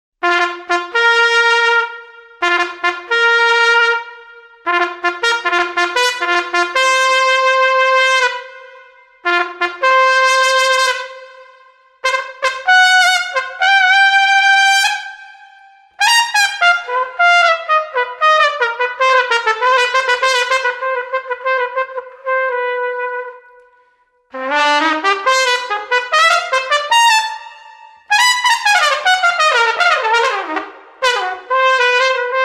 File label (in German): trompete